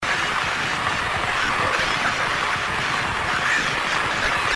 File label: radio; Silent Hill 2; PC Gamer UK